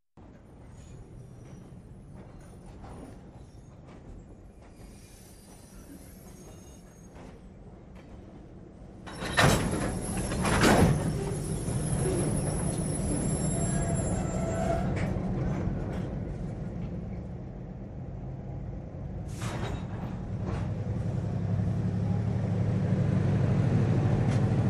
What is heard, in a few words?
Train Train Coupling Effects Train And Truck Bangs Creak; Hollywood Edge 3-D SFx